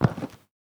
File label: concrete, footsteps; Sourceforts2.0